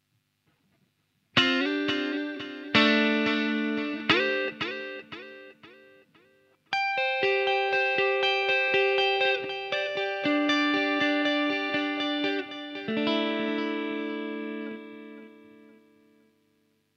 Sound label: digital delay